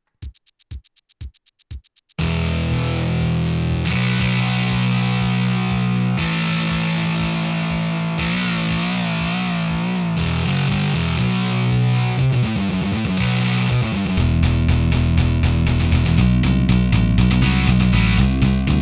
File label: rock; New guitar song